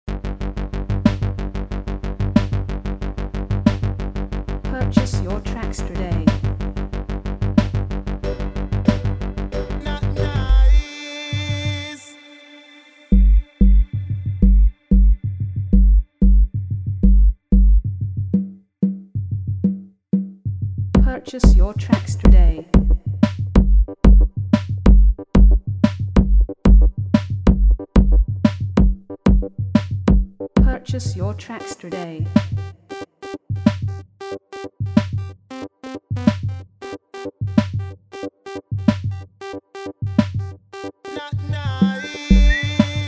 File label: dancehall 90s, riddim